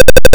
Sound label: refuel, ~516 Hz, ~14.6 ms per call; uses the ROM BEEPER routine with DE=$0007, HL=$0333 (8 cycles, ~516 Hz, ~14.6 ms per call).; River Raid